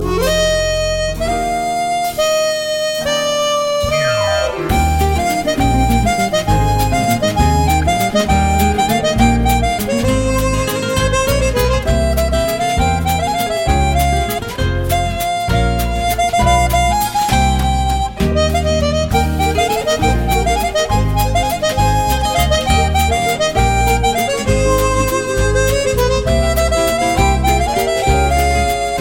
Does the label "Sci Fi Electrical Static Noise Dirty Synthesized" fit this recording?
No